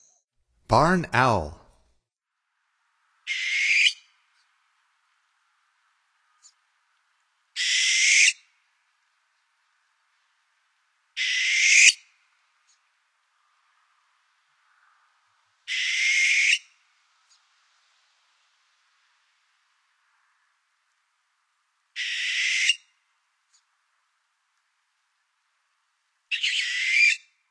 Barn Owl; North America; Stokes

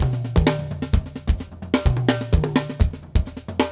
metal

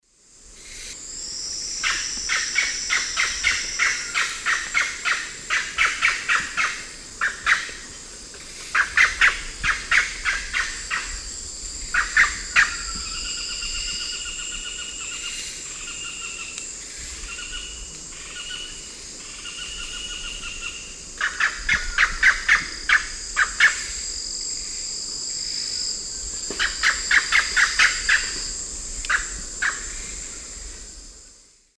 Psarocolius decumanus, call; S. America; Eastern Lowlands

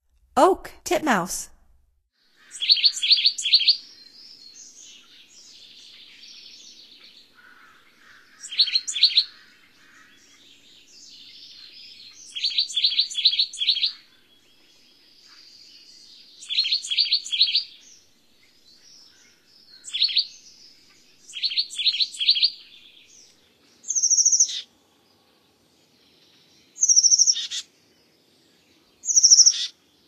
Oak Titmouse, Songs of Birds of North America; Stokes

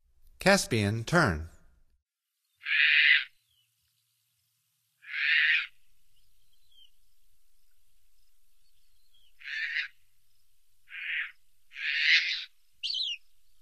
Caspian Tern; North America; Stokes

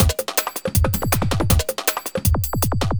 jungle juice 160

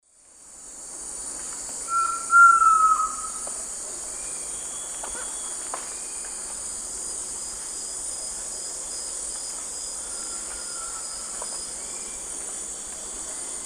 Grallaria dignissima, song variant; S. America; Eastern Lowlands

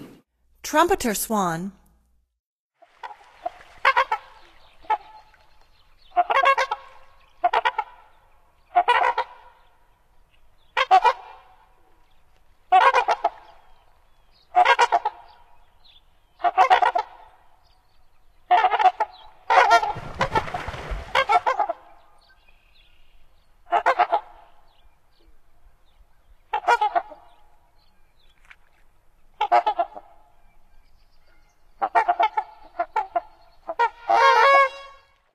Trumpeter Swan; North America; Stokes

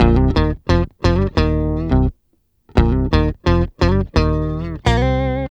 Bass C Funk

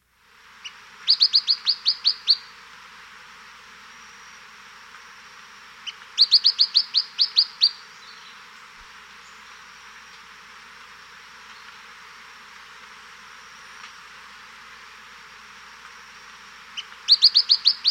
Tufted Flycatcher; S. America

